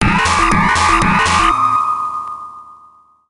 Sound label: Sting Unlock Collectable; Monsters vs Aliens; NDS